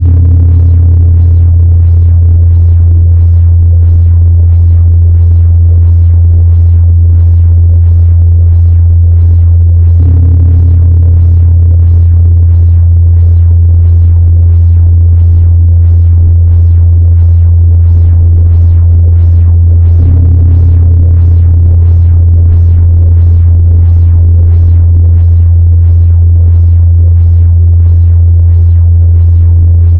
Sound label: ambient; SciFiShipController